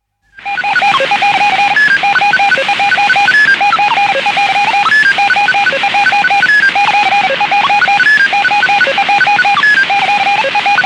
Vanguard 3 Satellite, telemetry effects from satellite; Miscellaneous Electronic; BBC